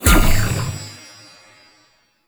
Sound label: Knight Wave Ability Cast; Warframe; Duviri; Dax Knight; Abilities